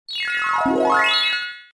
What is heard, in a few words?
flashback